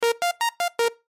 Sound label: Chime Musical, Chime Musical Multimedia; Electronic; Multimedia Web Interactive; Chimes; Blastwave